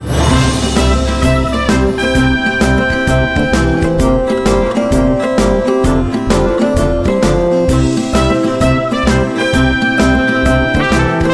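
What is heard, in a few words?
instrumental no intro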